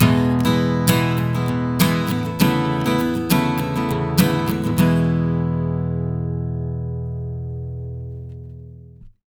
100 MAJ CHD E, Acoustic Guitar Loops; USB Soundscan vol.04; AKAI

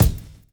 drum chop; AMG Gota Yashiki Groove Activator

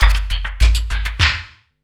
Industrial Loops

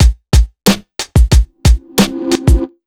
BEAT L, Pure Electro; USB Soundscan vol.03; AKAI

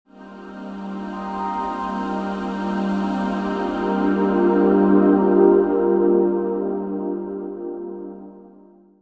Digi Pads; Jungle Frenzy 1

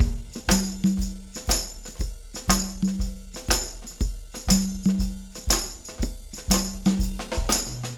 120 tam con

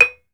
BRONZE, Bronze Gamelan

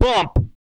BUMP, HIP-HOP, R&B Dance, Vocal; TB HIP-HOP

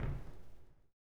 STOMPER; Spectrasonics Vocal Planet

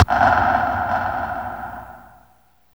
SLAP SLAPBACK, Impacts, Hits & Booms; Distored Reality

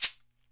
CABASA, Brazil Drum Perc; E-MU Producer Series Vol. 7 – Old World Instruments

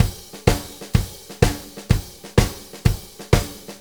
Blues Loop, Drum Tools, Full Kit Loops

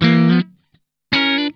INTERVAL, Funk Guitar; Zero G - Funk Guitar

